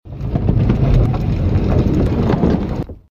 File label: door